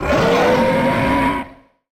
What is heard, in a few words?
monster